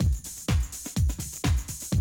125, Classic Drum Loops

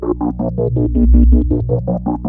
3100 DP A#2, Korg PS3100; Back In Time Records; Korg Universe VOL-1

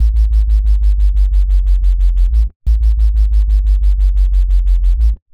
reason bass; Synths and FX; neuro; Sinistarr

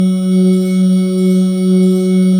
SYN NEONP; Sound & Vision - Gigapack I CD 2; JD 800/990

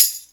TAM; E-MU Formula 4000 Series Vol. 2; OrbitPercMaster

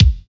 BD, BD Dance; Sound & Vision; Gigapack I CD 1

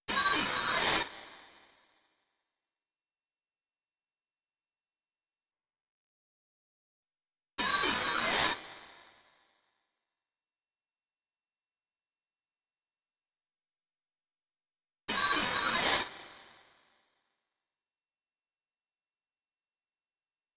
128 BPM FX Sample Fill; Vengeance - Essential Clubsounds Vol.5; Demo Constr Kits; Sidewalk Kit Root G

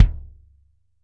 16X20, Drums; Big Fish Audio; Ross Garfield Drums VOL-2; OLYMPIA KIT

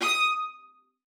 MART D#6, VIOLA SPICC; Xsample VOL-5; 90 s Sample CDs

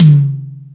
Rock N Roll, Drum Machines

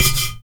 UDU, Supreme Beats - African Contem

